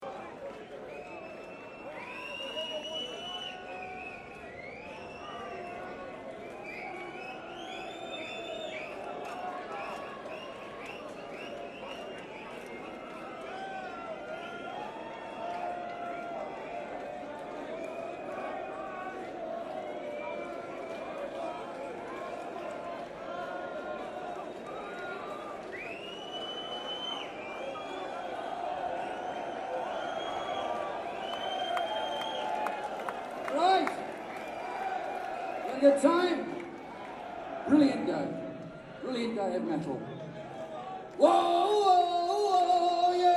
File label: Crowd, Live; IJsselhallen Zwolle Holland